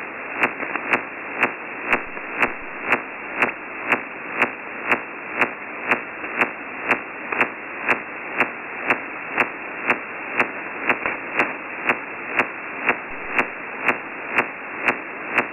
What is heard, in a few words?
Codar short range HF Radar 2 sweeps-sec